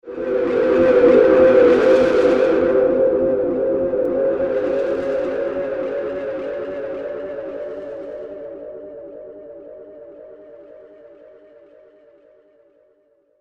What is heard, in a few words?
Stranger Than Strange Ambience; Scary Soundscape Sound Effects; horror sound effect; Andrew Fleming